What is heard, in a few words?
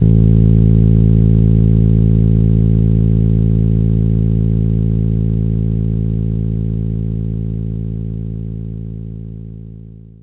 fretless bass A1